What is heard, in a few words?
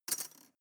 cutlery teaspoon drawer place